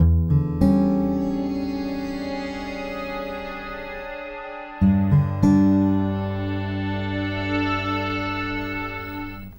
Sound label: Guitar And Synth